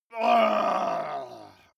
male groan painful

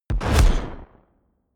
sci-fi space gun zing; pistolen gewehre

